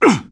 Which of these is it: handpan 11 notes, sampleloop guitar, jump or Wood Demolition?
jump